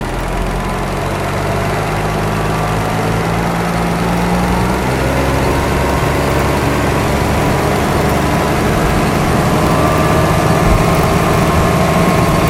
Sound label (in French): Accélération